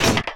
default stop, doors; Dystopia

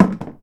plastic barrel hard; Dystopia; physics

